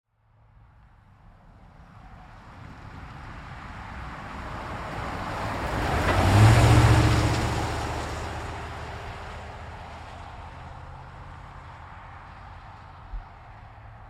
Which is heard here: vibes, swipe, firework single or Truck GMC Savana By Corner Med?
Truck GMC Savana By Corner Med